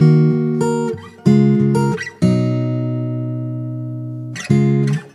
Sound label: guitar, Jazzmatazz loops